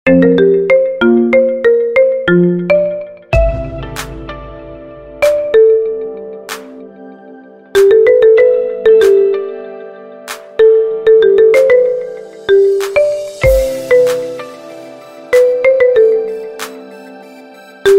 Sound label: marimba, ringtone